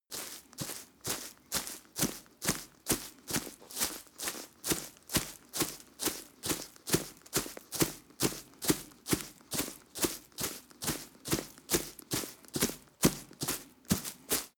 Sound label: step grass walk